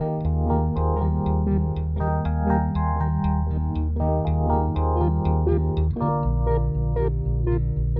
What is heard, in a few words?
120 D Charms Backing